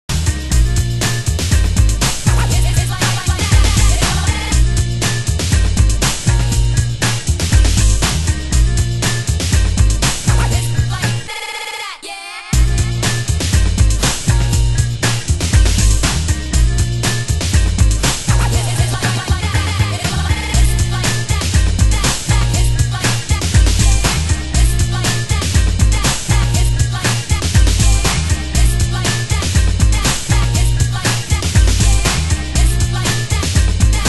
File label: funk